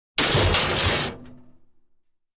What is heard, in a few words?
Crashing sounds